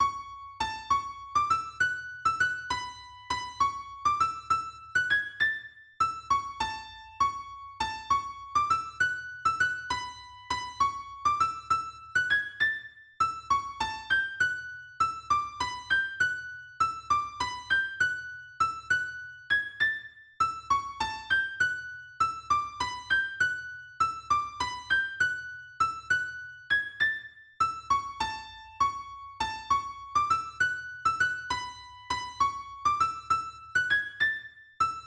Solo EGit; Melodie-Solos

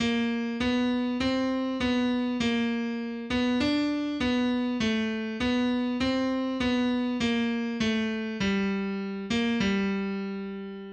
Vorübung, der Ton a# bzw. bb auf der A-Saite, Gitarre; a#/bb3; Gitarrenkurs zum Erlernen des Notenspiels auf den untersten vier Bünden

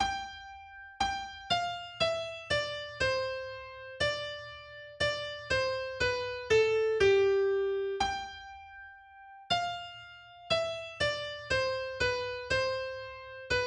Echo Solo AkGit; Haussmann

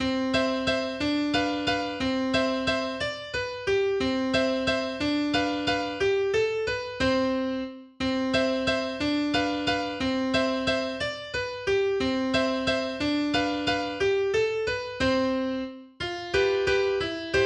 Valse, mehrstimmige Gitarrenstücke; Carulli

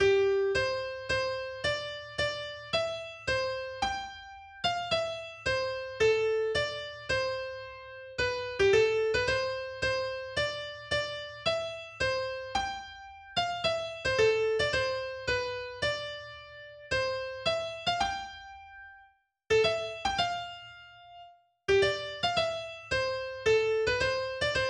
Sonatine Solo AkGit; Giuliani

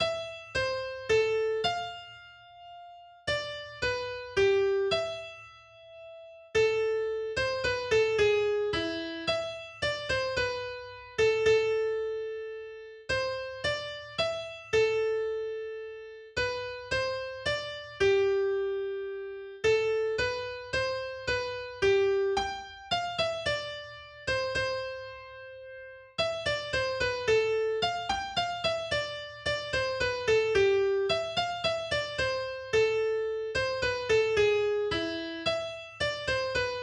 Menuett in A Moll Solo AkGit; Krieger

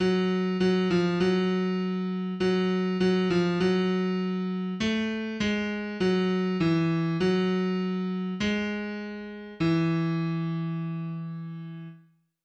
Vorübung, der Ton f# bzw. gb auf der tiefen E-Saite, Gitarre; f#/gb3; Gitarrenkurs zum Erlernen des Notenspiels auf den untersten vier Bünden

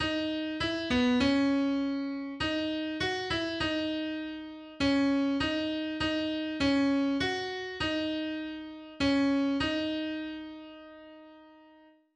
Vorübung, der Ton d# bzw. eb auf der D-Saite, Gitarre; d#/eb4; Gitarrenkurs zum Erlernen des Notenspiels auf den untersten vier Bünden

